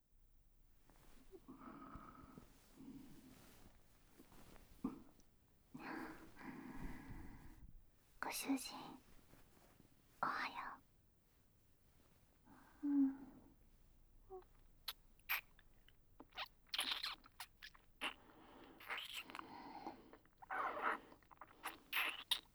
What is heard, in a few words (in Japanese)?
モーニング求愛ベロチュー＆ご奉仕フェラ; おはようのモーニング求愛ベロチュー＆ご奉仕フェラ